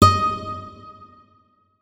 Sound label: notes, guitar; Hideout Furniture